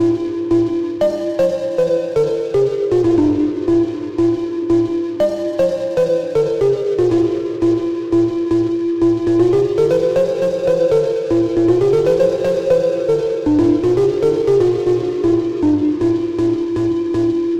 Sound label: synth loop; erokia